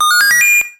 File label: Card Match Suc; SMB3